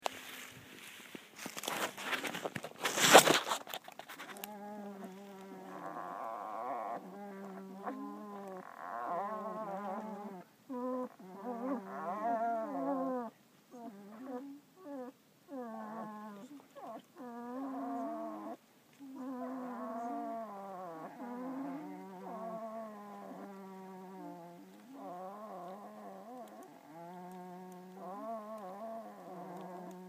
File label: wolf pups